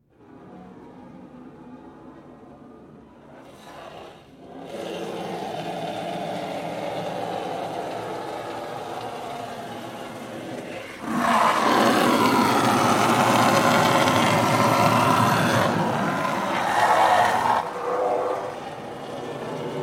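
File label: Water Spray Interior Car By Hard Long; Car Wash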